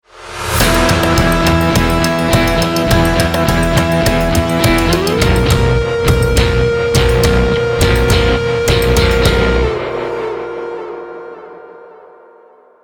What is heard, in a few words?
bomb ten sec count